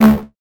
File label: science fiction retina finger id scanner tone